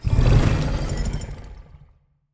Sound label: ui load